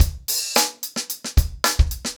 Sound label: 110BPM, acoustic drums; The Roots Drumloops